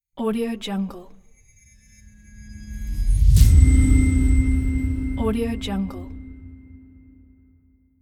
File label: cinematic tone; arashdarehshouri